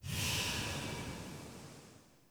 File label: UI Faction Choice Rollover